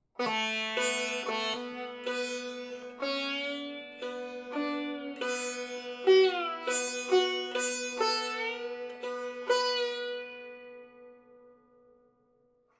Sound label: Sitar B min free time